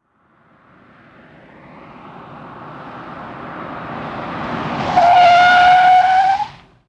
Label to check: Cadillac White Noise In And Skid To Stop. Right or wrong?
Right